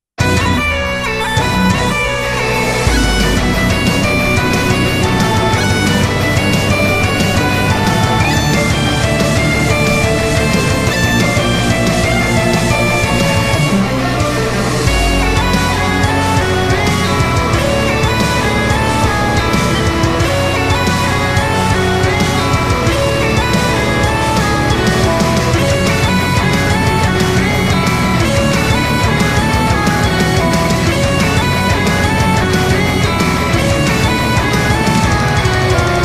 bagpipe cover

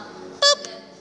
Boop

